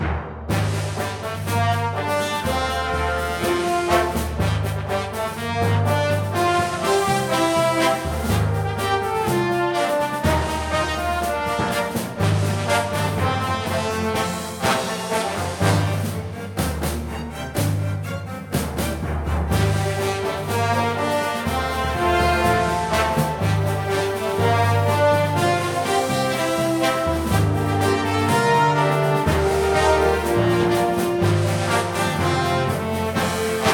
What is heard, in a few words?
campaign music; portal quest android